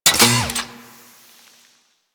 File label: Torpedo Pistol Mech, weapons; Grn Torpedo Pistol